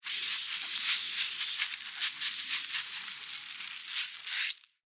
Spider Web Wrap, Spider, Web, Wrap, Cocoon, Arachnid; Insect, Bug; Spiders; Animals:Insects